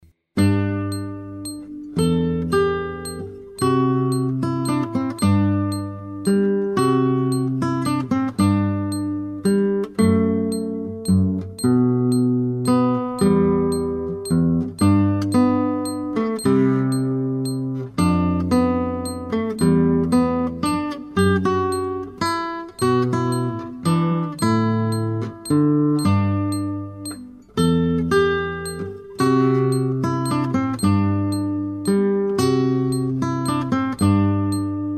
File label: guitar